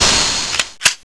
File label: LASRIFLE